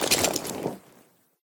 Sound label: naked, ledge grabbing Vaulting; Ledge Climbing Sounds Redone